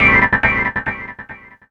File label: KEY SHOT TEKNO 140 A; Techno2; Singleshots